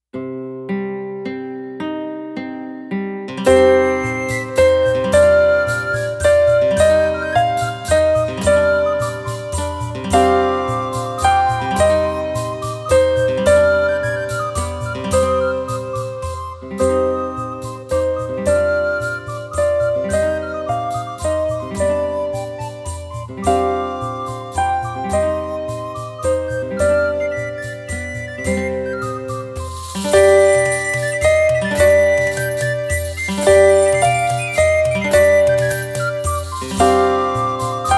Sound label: Scarf Dance Slow